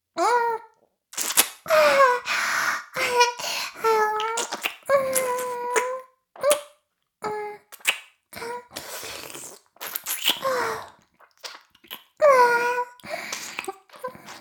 フェラ音声; おにいちゃんアイスぅー！; 妹

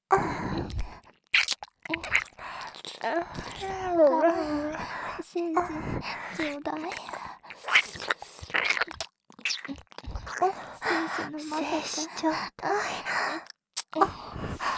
耳舐め 喘ぎ; 精子ちょうだい♪

